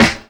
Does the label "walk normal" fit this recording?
No